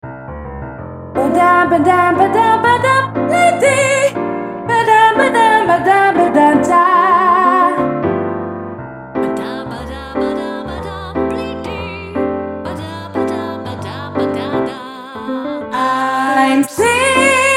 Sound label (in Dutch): sopraan